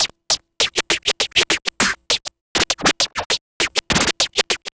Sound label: Vinyl Scratch Snare